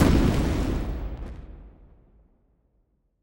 Explosive Nova, Explosive SuperNova – Explosion Sound FX